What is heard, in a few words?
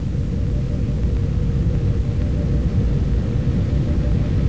bkgrnd